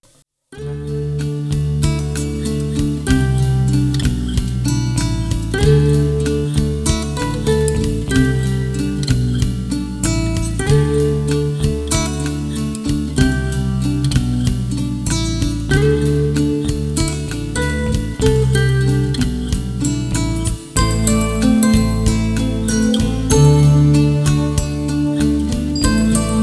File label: Instrumental